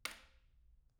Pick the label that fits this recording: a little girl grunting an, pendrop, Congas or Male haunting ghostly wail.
pendrop